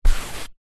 brush small hand held sweep single sofa; household; zapsplat

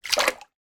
household water movement in kitchen sink bowl

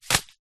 seeds packet black chia set down on wood counter

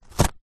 paper sheet cut with serrated knife; foley

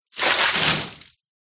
water pour throw onto wood floor; nature

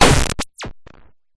Laser-Like Synth Laser Noise Blast Oneshot; sound spark

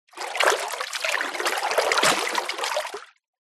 nature water movement gentle

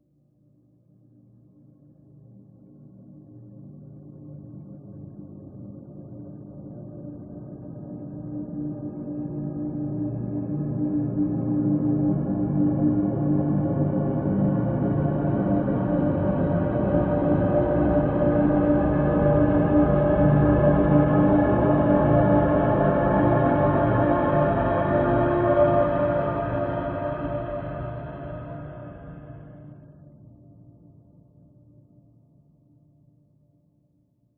horror drone slow build ghostly; zapsplat

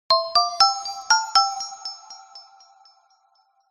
multimedia musical tone chime generic; zapsplat

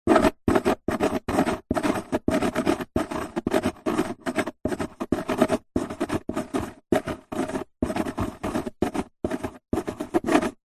handwriting pencil write on cardboard box; office

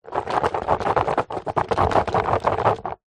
leisure puzzle card box shake no lid

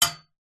impact metal frame pole hollow metal; zapsplat

